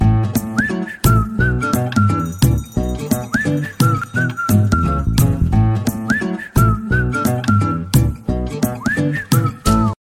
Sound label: sms tune